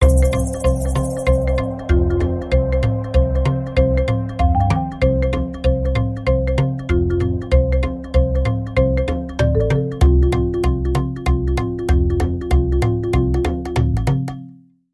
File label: Serengeti Marimba